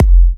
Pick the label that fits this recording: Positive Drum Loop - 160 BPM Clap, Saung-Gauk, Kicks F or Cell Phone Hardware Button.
Kicks F